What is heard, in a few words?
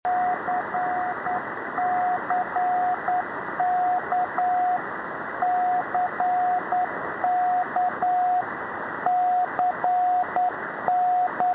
Morse numbers stations; Beacon "C" hickup; Sends dots and dashes at the same pace as E11 and starts and ends at the same time as E11.